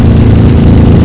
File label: engine